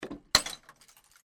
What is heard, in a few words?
Bottle Smash Slightly; Smashes; Objects; CraftedSignals